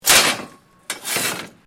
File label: METAL CRASH; Materials